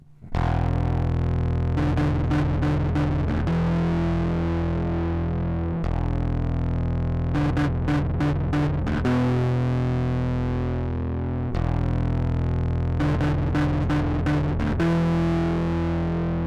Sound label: 1N60+1N4002 Center, Over Drive; シンプルなOver Driveの製作